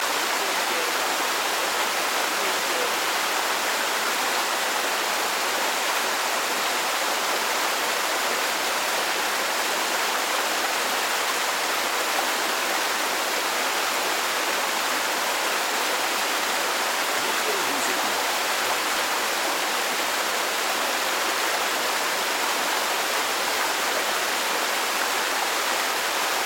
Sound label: Fountain Run Small